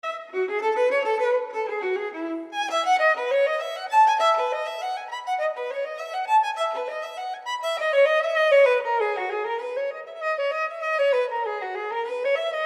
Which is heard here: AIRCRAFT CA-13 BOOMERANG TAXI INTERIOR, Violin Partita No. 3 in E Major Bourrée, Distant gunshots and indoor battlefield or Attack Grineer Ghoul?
Violin Partita No. 3 in E Major Bourrée